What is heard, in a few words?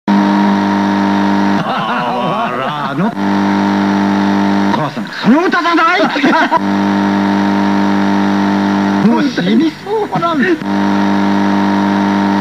noise, am antenna